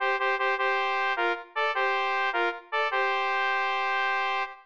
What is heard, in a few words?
fanfare